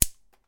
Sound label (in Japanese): コードをハサミで切る; cut cord; 文房具